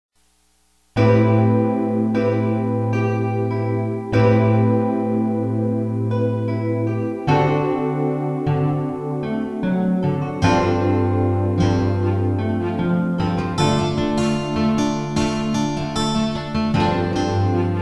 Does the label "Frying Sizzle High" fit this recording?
No